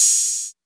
dark tone open high hat g sharp key, Royality free open hat sample tuned to the G# note